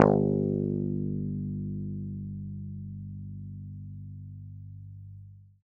g2 fff, swagbass

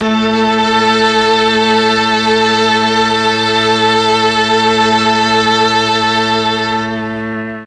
A2 MIXED STR, mellotron; MIXED STRGS

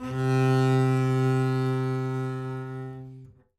c3 down, bass arco; meatbass

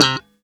c4 staccato, glowly bass

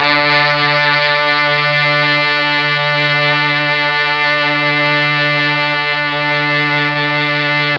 D2 brass, mellotron; MKII BRASS

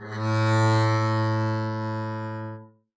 arco a2 f down, double bass

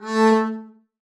arco a3 ff up, double bass

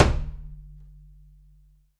perc, pizz; meatbass

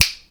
percussion snap; drums; one-shots

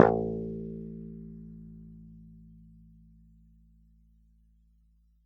a2 fff, swagbass

